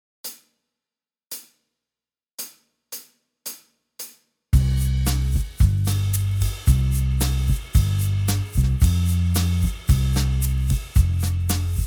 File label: bossa 112; Etude 2 Backing Track